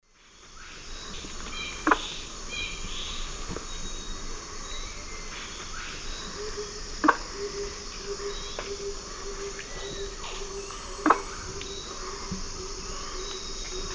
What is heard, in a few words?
Aramides cajanea, calls; S. America; Eastern Lowlands